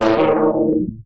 Odd Tone Transition

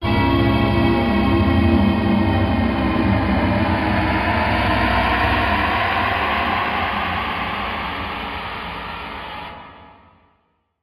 Low Galaxy Tone

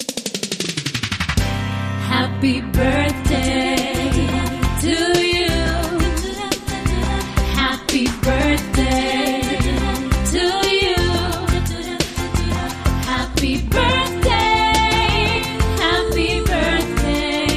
Female Vocal Pop Happy Birthday

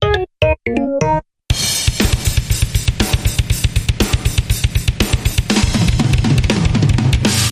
Funk Rapids Rock Bumper

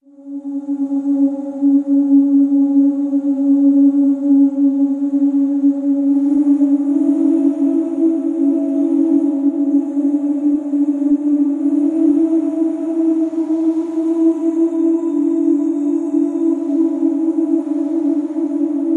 Apocalypse, Voice Pad Only

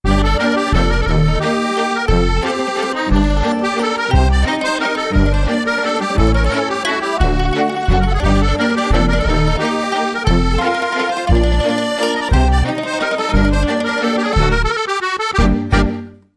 Jingle No Percussion

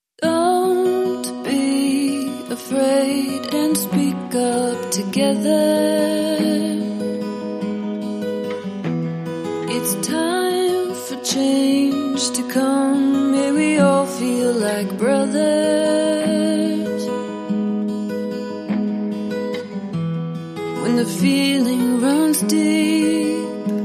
Acoustic Guitars and Vocals

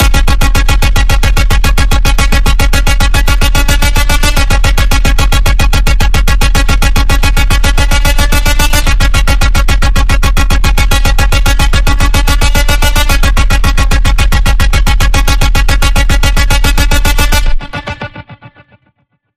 Pulse, C sharp

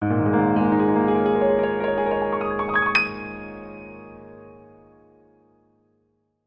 Piano Floating Up

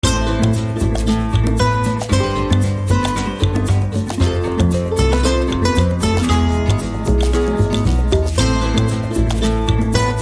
Acoustic Rhumba

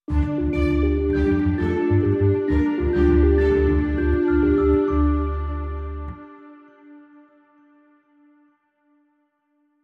Sting No Drums

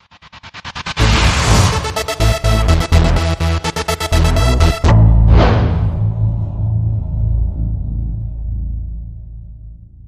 Electrolized Sweep

